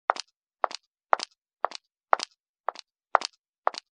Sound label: footsteps male